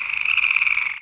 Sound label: critter, canals; Half Life Model Pack; Half Life 2; ambient levels canals